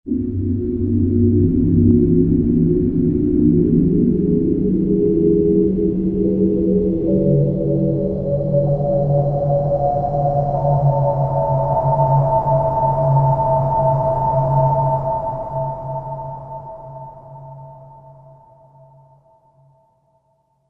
Ice Whistle, Korg TR-Rack

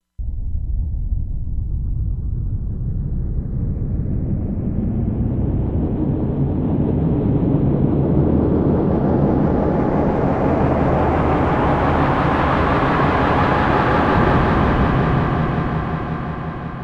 Earthquake; Korg MSC-16S Environment

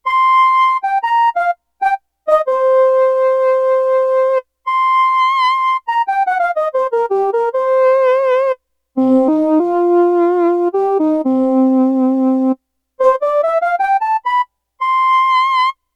Realistic Flute, Roland VC-1

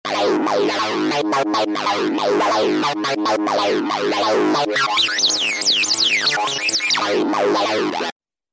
Radio 303, Roland V-Synth; Factory Patches

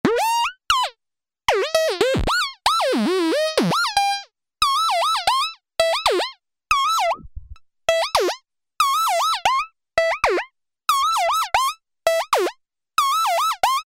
Auto-Techno, Alesis airSynth

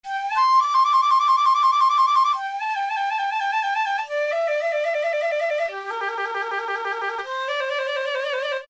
Fife Trills; Brass And Woodwinds; Roland L-CDX-03

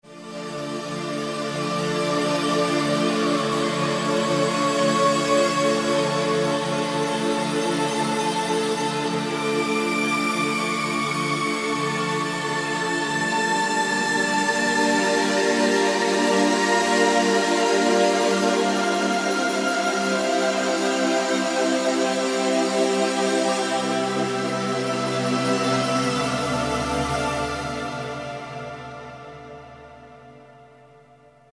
Glass Voices, Roland PN-D50-03

